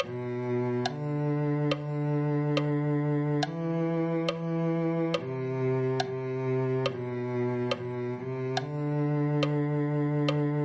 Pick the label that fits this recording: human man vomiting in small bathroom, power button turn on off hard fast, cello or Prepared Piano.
cello